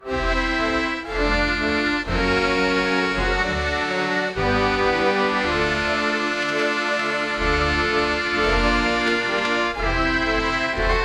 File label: swashbuckling orchestral, adventure